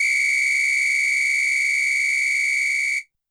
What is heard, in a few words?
Samba Whistle H, YAMAHA RM1X